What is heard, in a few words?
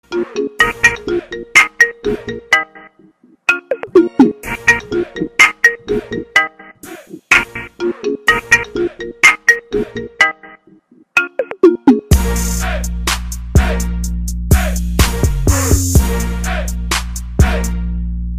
iphone 6 pop mix tone